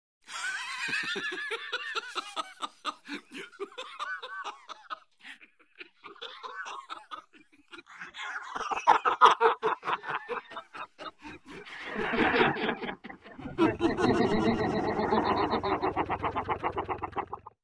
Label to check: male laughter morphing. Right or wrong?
Right